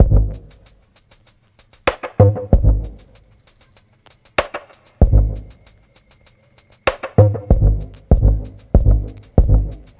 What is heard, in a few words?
Drums